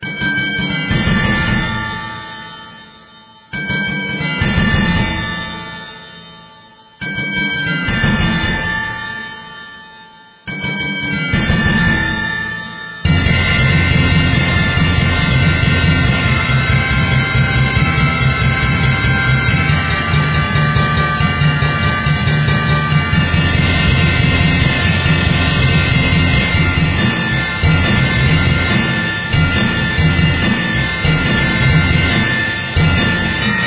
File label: Manic Percussion